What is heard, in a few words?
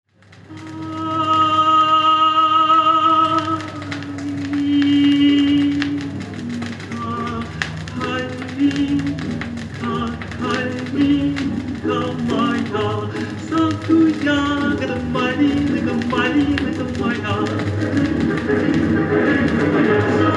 accelerando kalinka